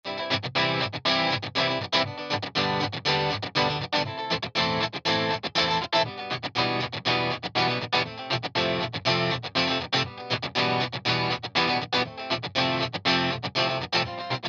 EG Dry

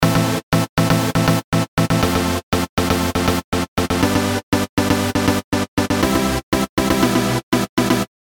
Synth Dry; Byome

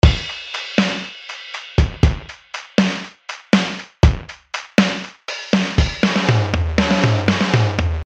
drums omni

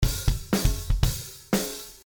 Beat Dry

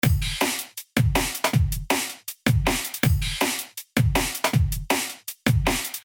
DRUMS TAIL DRY

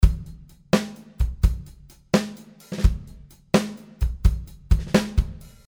drums sculpt

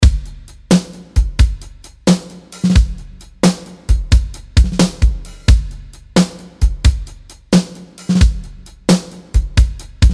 Drum Plate; ModoDrum

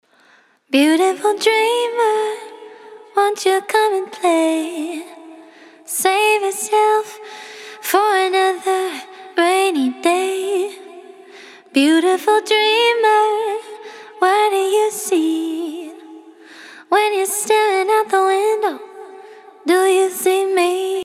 Vocal Delay Reverb

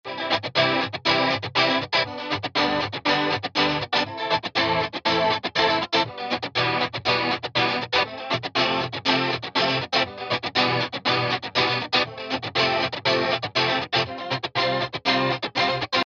wet

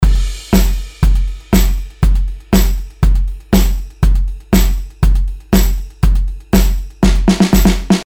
DRUMS LOFI DRY

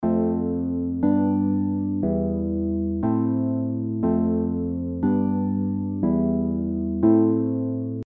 EP Wet Full

